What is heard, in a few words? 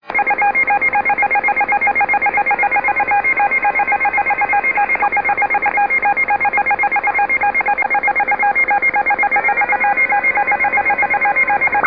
unid beeps siren; 27145kHz; Possibly ISM use; Unid Tone/Siren 27145kHz 07Oct2001 Possibly ISM use